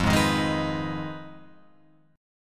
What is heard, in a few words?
F Minor 7th Flat 5th, Guitar, Open F; strummed; JGuitar